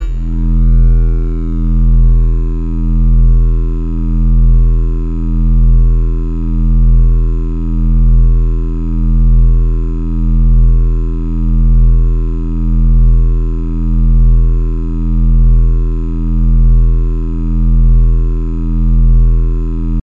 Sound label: Huge Laser